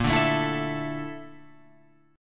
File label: Bb Major 7th Flat 5th, Guitar, Open F; 10,9,9,11,12,11; strummed